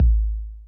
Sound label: kicks, Bass Drum